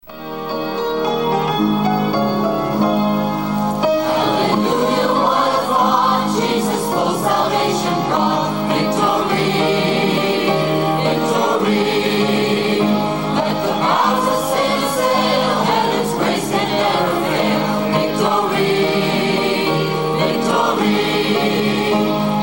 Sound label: Choir, Victory